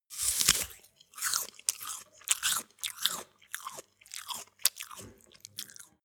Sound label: apple sequence